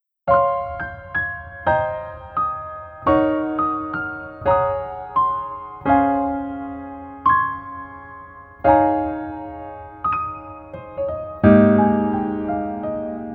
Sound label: Piano ver, 멜로디MR